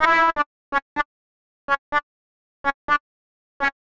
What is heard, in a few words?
125 Fluty Lick E, Keys, Nu Jazz House; PowerFX - Nu Jazz House